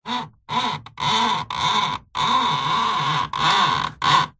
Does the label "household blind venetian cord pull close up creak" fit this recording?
Yes